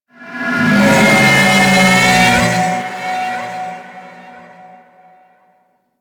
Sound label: Vocal Pain, Enemies; Warframe; Teralyst